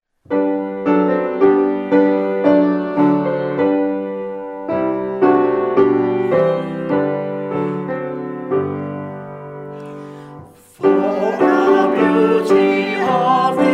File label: Closing Hymn